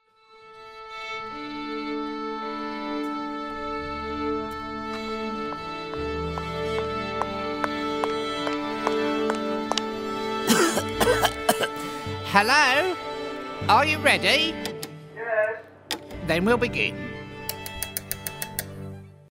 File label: Tuning; Monty Python's Spamalot